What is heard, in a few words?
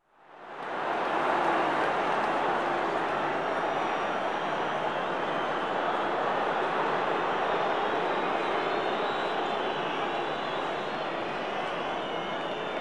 Football Crowd - Boos And Whistle; Crowds; BBC Sound Effects Library